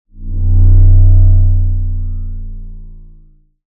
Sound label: large long rumble fly by; soundscrate